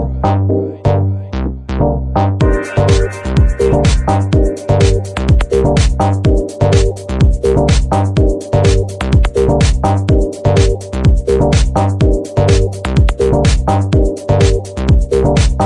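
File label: progressive deep house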